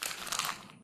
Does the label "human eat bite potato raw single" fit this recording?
No